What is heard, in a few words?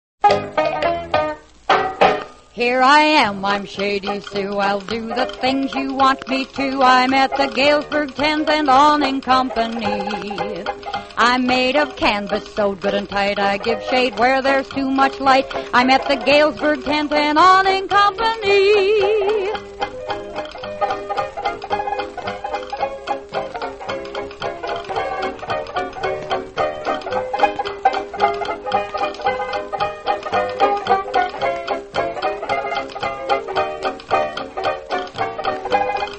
radio jingles; galesberg tent company; allied radio artists